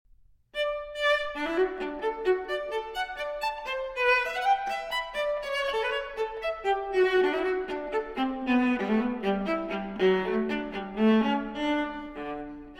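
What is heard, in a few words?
Cello Suite No. 6 in D Major Transcription For Viola Courante; J.S. Bach; BWV 1012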